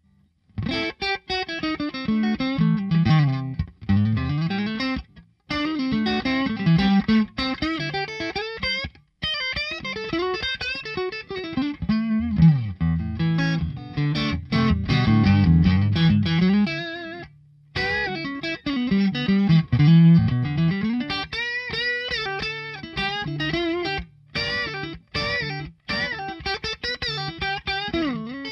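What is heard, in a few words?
Neck Pup, guitar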